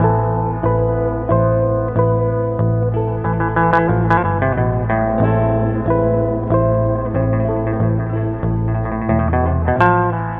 guitar, Sad

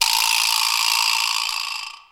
vibraslap

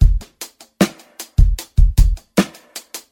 notions, Drum loops Boombap

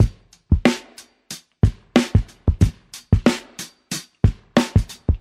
repeater, Boombap drum loops

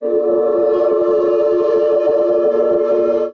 prayer, Voice

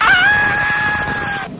scream nongender; ooh ahh

